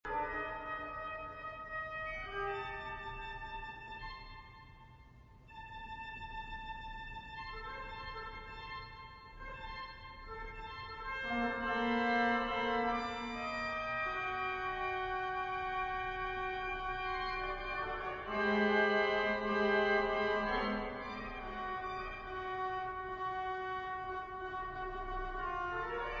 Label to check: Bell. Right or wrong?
Right